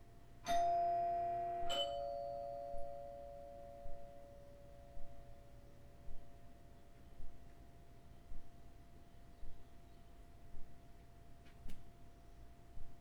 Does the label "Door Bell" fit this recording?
Yes